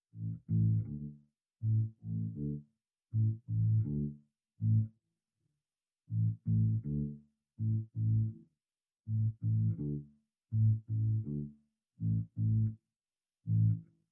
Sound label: Bass